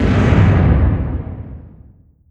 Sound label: distance ship hit small effect; Hearts of Iron IV Death or Dishonor